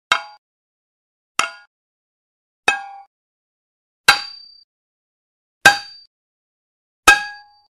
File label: Metal Impacts Dull Dull Impact With Light Ring Out; Hollywood Edge Foley Sound Library